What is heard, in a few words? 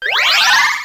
Coin; PuzzleBobble2